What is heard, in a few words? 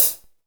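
hi-hat, Real Mega Drums; Best Service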